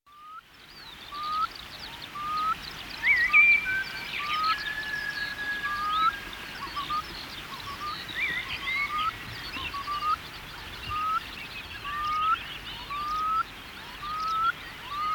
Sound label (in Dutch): wulp